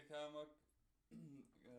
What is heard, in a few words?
Bass Mic